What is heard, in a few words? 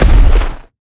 sfx skill, ZombieSkill SFX; IdleRPG2024